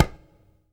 TABLA KAT; AKAI S6000 CD-ROM - Volume 5

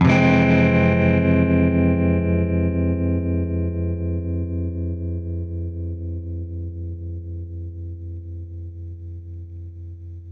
Baritone Chord Fmin[maj7], Guitar Chords; undercover samples

